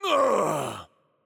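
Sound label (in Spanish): Hurt Heavy, Mexican Spanish; es MX; VO; bloom minigame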